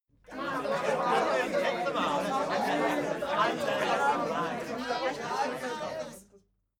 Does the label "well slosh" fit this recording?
No